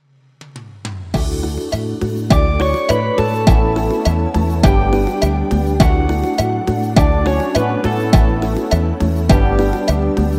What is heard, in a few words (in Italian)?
Cumbia Canto